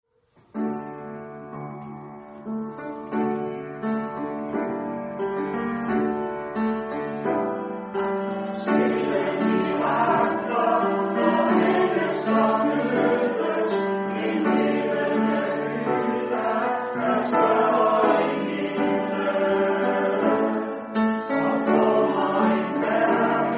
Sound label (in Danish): stille mirakler alt tenor